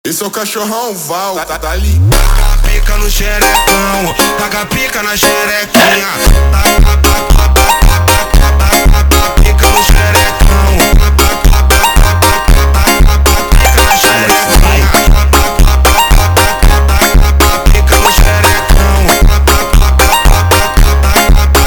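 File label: slowed, phonk music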